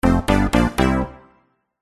multimedia game sound musical warm bold retro 70s synth complete finish